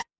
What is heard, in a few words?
ui menu